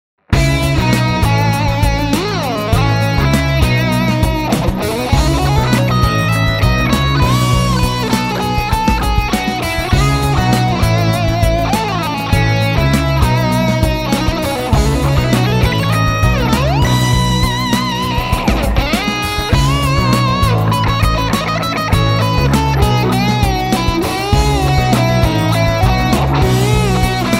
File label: Modern Pop